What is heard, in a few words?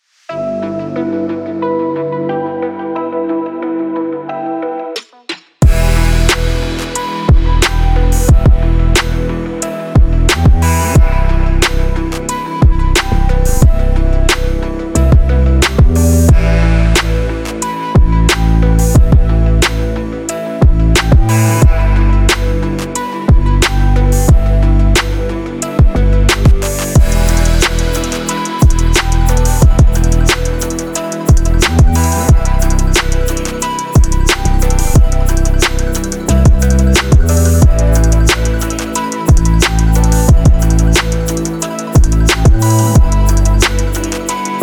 technology extreme sport